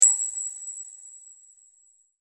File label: coin toss